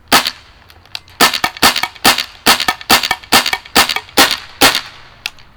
clipped trracer long, guns; clipped trracer long declip test

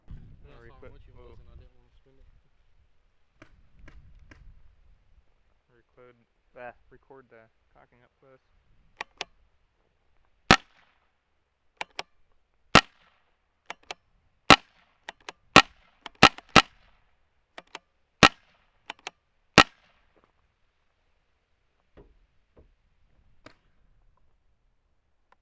trracer shotgun cock, guns

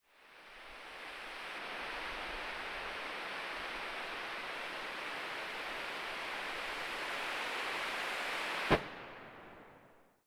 smokegren end, guns

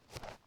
step grass, footsteps

